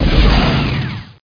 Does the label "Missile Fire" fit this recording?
Yes